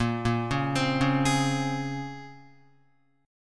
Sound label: Bb Minor 9th Bb, Guitar; Bbm9; arpeggiated; 5,1,1,5,1,4; JGuitar